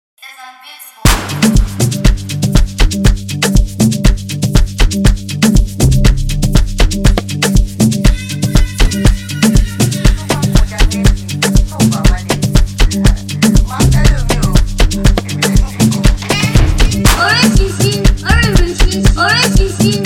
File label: Amapiano Type Beat; With Hook; GHSBeatz